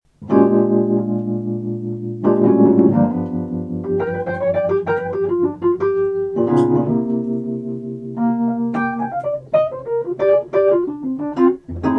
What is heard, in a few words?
wurlitzer installed in studio